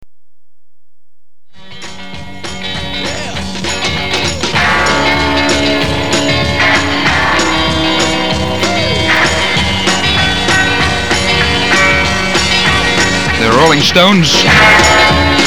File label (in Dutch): tune; De tune van de eerste cassette-uitzending van de Nationale Hitparade; gepresenteerd door Frank de Vries op 9 januari 1978